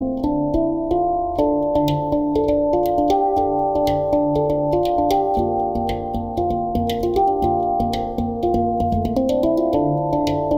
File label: handpan 10 notes d minor; celestial